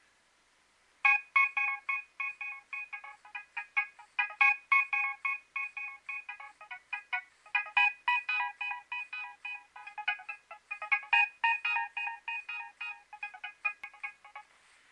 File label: nokia3100 ringtone